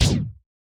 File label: combat air plasma fast; geoscape